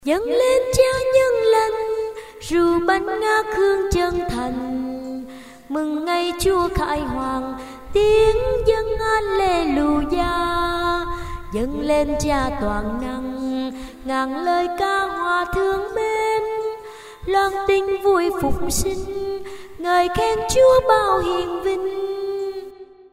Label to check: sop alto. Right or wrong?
Right